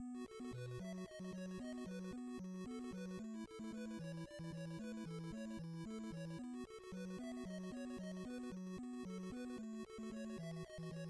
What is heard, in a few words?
mus main fast; tetris